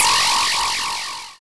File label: SFX E Laser; Graze Counter